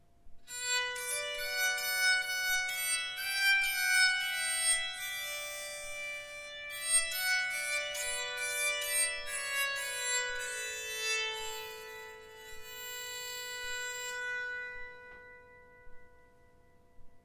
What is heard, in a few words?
song, bowed psaltery; Ringing Strings Bowed Psalteries